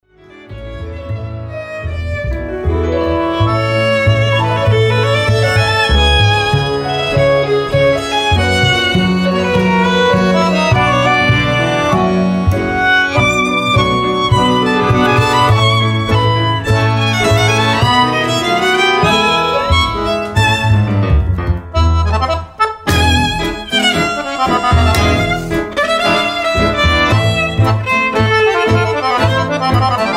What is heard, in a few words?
Tango